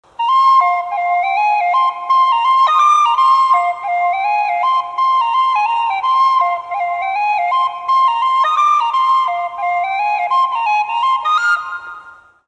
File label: Irish flute; فلوت ایرلندی